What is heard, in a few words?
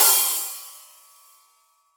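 Half Hat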